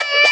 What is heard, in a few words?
D STAB, Funky Underground